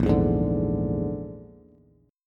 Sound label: A sharp 7th Flat 5th, Bass chord; A#7b5 strummed; JGuitar